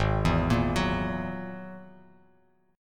G sharp 7th Suspended 2nd Sharp 5th G sharp, down, Bass Standard; G#7sus2#5; arpeggiated; JGuitar